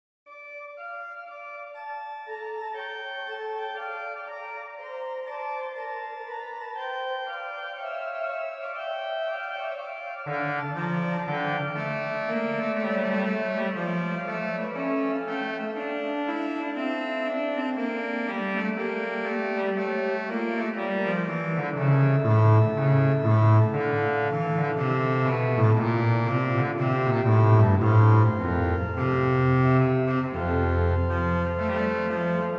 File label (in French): Basse de Trompette, OrganMusic; Marchand; Souvigny